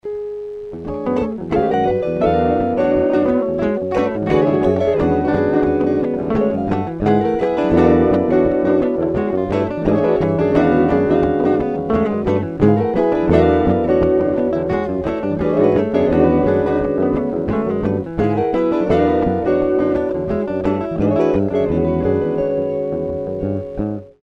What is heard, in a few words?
Bluesy Full